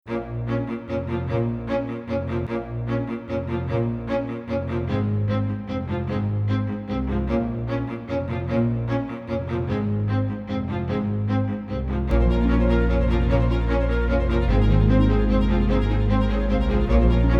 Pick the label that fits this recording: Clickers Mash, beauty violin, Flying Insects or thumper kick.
beauty violin